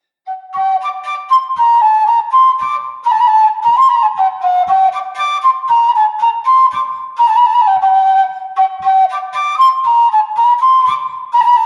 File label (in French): Autre Bourée d'Auvergne Airs de vielle